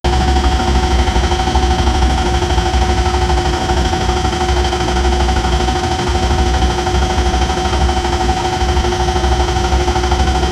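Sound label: boat engine sound no water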